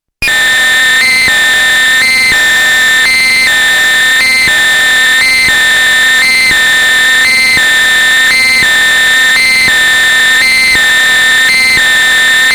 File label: Error